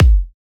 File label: One Shot Piano House Kick Clip Drum Sub Thump Fat